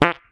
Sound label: ogre fart